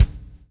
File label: PULL, Backbeat; Spectrasonics - Backbeat